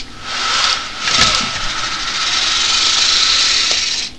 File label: BLINDS